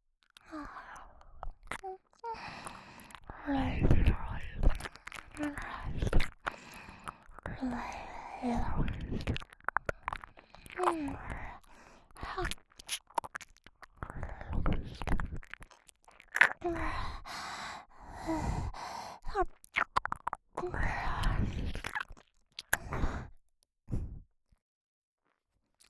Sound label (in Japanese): 耳舐め(左); 突然サキュバスになったギャル妹がエロすぎる